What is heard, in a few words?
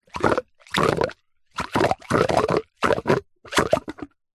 foley liquid pipe plastic flexi gurgle short bursts water movement inside